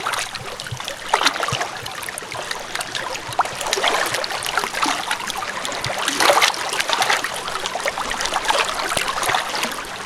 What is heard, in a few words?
Waves On The Bay